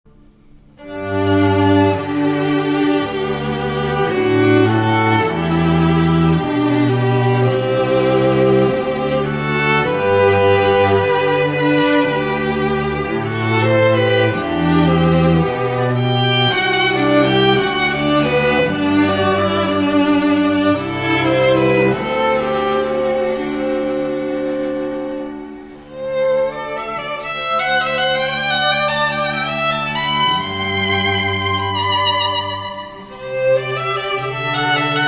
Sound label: String Trio; Cantilena South Florida